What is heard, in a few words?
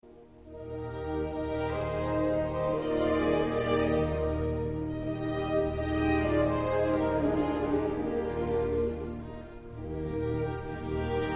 musette